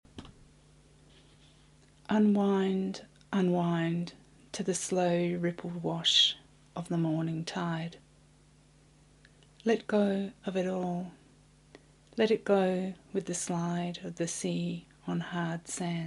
Acappella

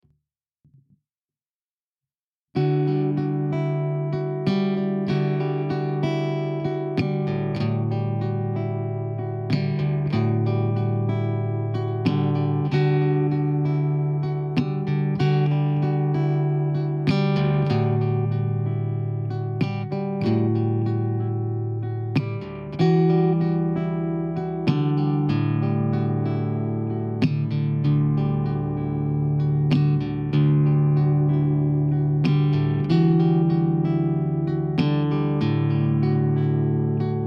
D95 GTR Mellow Arps

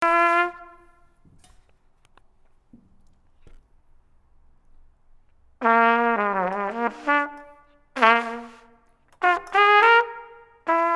Trumpet Whale Tones

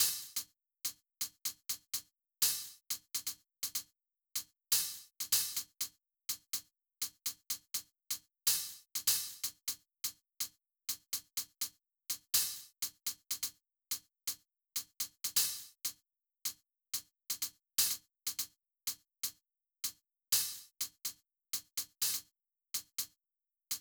drums hats

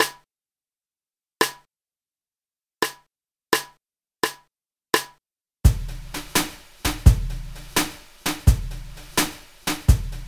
Jazz Blues in Em 85 bpm

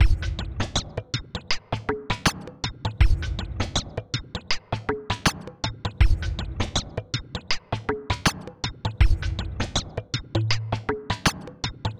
beat 80 bpm; Mixter Plus Deep Roots beat pack

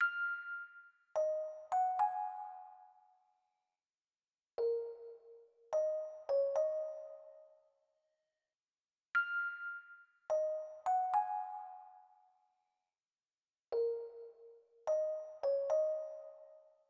March of the Bells

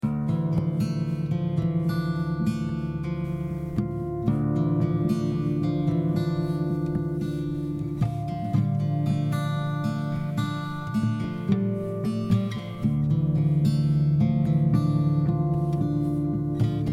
Dm112 Spooky Blues

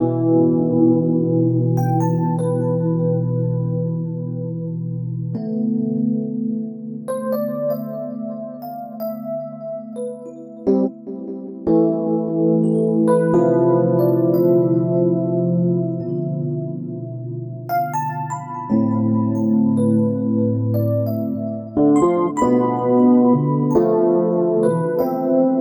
Em90 slo Sunday stereo mix

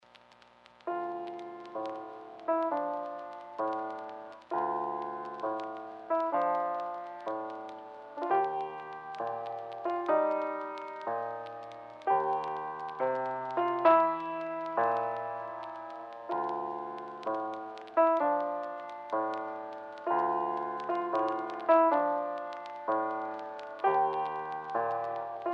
Piano Piece

